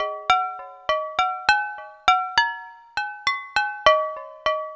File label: Struck Glass Melody; Ambient; Melodic; Ambient-Melodics